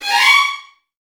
VN FX, Virtuoso Strings

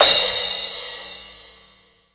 CRSH, CRASHS, House Side Of 2 Step; USB Soundscan vol.51; AKAI

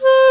WND CLAR, Clarinet Sh

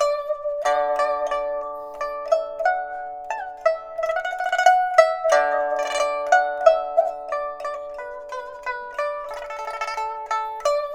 PIPA, 90; INIS - Opium; PIPA 90-D

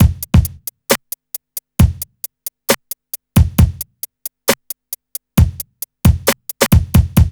BEAT, Hip Hop R n B; USB Soundscan vol.19; AKAI

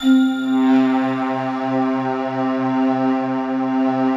glockenflute, Gloctave; Optical Media International; Sonic Images Library

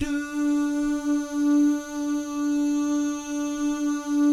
DOO GUYS, Vocal Jazz FX; Spectrasonics; Vocal Planet; JAZZCOMBO

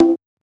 Old Skool House Perc

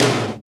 TOM TOM

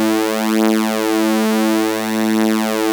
OB A3, Rare Synthesizer; Hollywood Edge - Giorgio Moroder Rare Synthesizer Collection; OB 4 VOX.

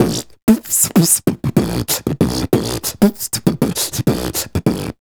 Beat Box, Vocal; Sonic Foundry (Sony Creative Software); Vocal Toolbox

